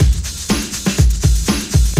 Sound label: Loop, Roland; Sound & Vision - Gigapack I